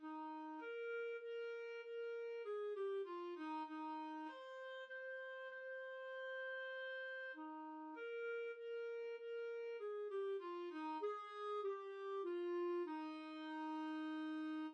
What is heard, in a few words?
lavenders blue, clarinet